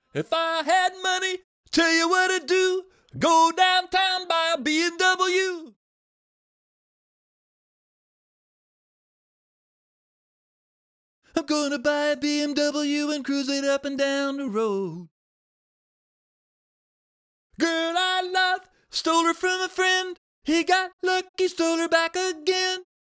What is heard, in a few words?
Vocal, Blues; BMW Blues EWU